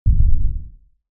horror footstep massive distant heavy dinasour monster giant; zapsplat